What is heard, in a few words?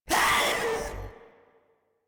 Proto Infested Runner Pain; Enemies; Vocals; Warframe